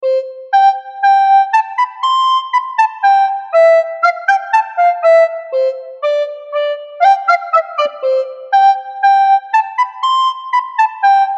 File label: march 120